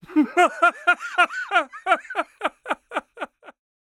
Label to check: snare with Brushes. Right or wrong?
Wrong